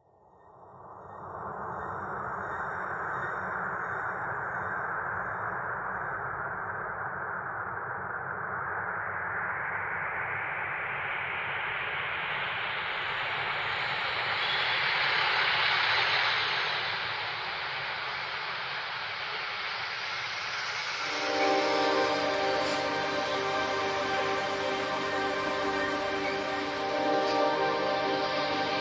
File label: Drum and Bass Textures; Loopmasters Commix